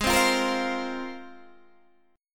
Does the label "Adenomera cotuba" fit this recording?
No